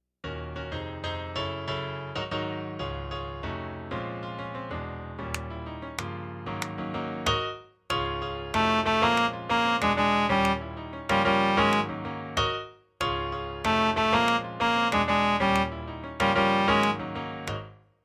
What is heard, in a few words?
Basses